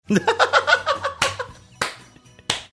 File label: laughing clapping